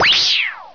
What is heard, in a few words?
SERVO